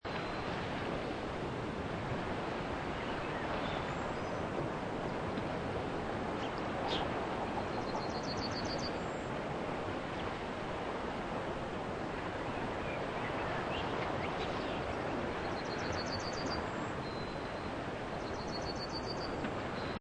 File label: Birds By The Sea Daytime Loop, Few small birds singing at the seaside, very light breeze and gentl; Few small birds singing at the seaside, very light breeze and gentle ocean waves. Seamless loop.